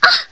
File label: "Ahh"; Riviera; Serene